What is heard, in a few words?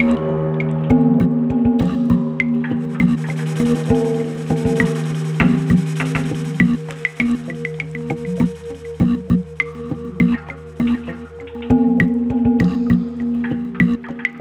Water; Chasing Aurora; Wii U